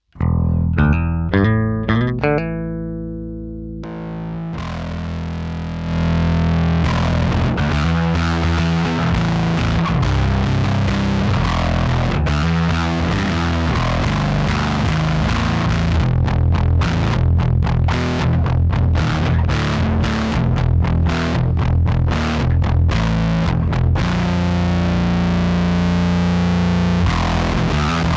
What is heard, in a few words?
silly fuzz